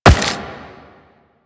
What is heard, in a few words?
Run Rock, Footsteps; Warframe; The New War; Kahl; Quests